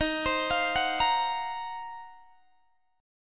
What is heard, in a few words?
Eb Minor 6th Add 9th Eb down Guitar Standard; arpeggiated